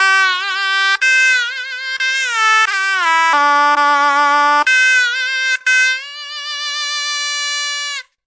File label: reedy instrument melody; WORLD